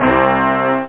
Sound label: Horn stab; Brass+Woodwind